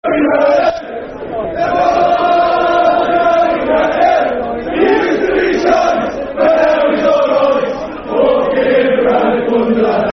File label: Football Chants (Soccer Song); One Jody Craddock; Wolves; wolverhampton wanderers; fanchants